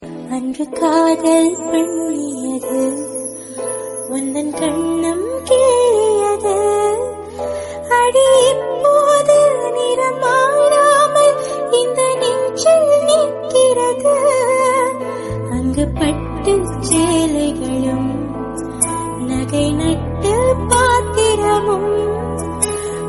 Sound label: instrumental